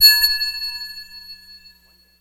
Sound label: Crystal, Strike, Scrape, Ringing; Universal SFX